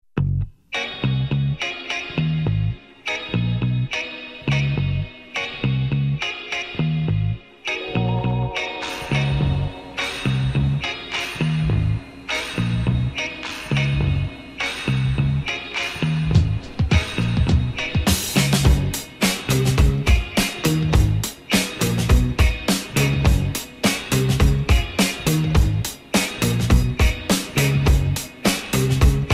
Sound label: instru